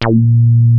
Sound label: WEST SOFT B2, ANALOG BASS; Music Store - Claudius Diemeter Schwerlos